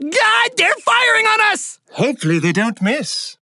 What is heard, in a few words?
Taking Damage; Ruckus; Paladins